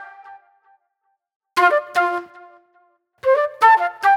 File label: Disco Flute